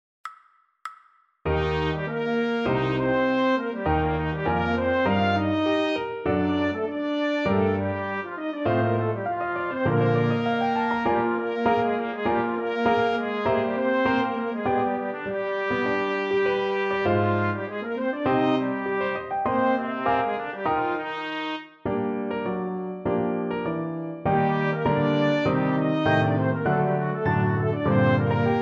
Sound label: trumpet